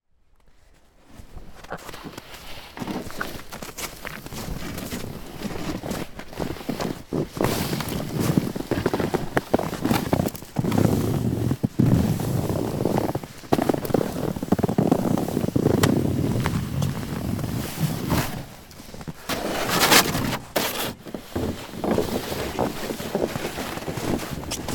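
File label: snow shoveling; 雪かき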